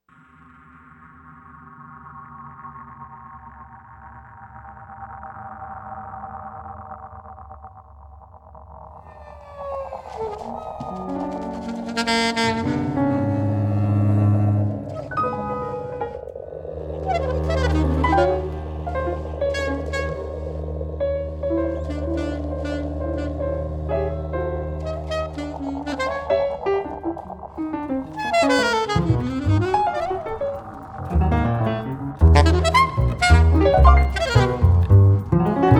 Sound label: loop screw